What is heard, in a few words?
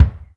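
Ground Hit; Falcon4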